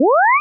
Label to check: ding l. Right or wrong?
Wrong